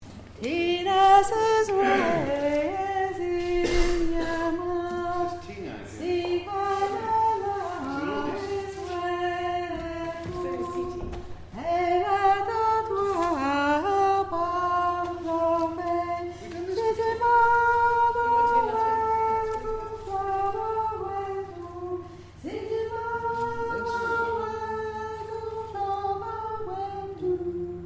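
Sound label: soprano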